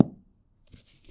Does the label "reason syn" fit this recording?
No